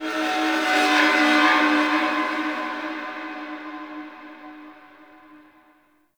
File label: Sentient Texture Medium A; Warframe; Sentient Lair